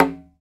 LOW TUMBA, Conga Battery; E-MU Formula 4000 Series Vol. 4; Earth Tones

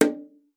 BONGO, BONGOS; Xsample VOL-9

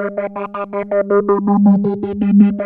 3100 G 4, Korg; Back In Time Records; Korg Universe VOL-1

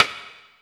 stick, 155 BPM; Drum & Bass; Ueberschall; Drum & Bass Resonance

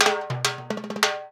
FILL, Percussion; InVision Interactive; Percussion Slam; SULTAN

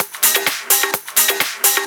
Discohouse Loop 128 BPM

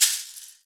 Shakers, Roland

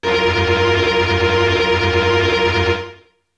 Melody oneshot; ZERO G - Datafile DISC 2

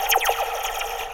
Sci Fi; Mo' Phatt; Digital Sound Factory Mo' Phatt

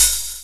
DR. LOOP, DRUMS; X-Static Goldmine 3

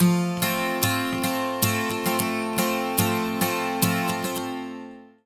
110 MAJ 12 STR, Acoustic Guitar Loops; USB Soundscan vol.04 - Electric & Acoustic Guitar Loops; AKAI

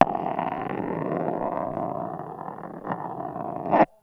Wood Floor, Marbles, contact mic; musicradar; contact mic madness; Raw

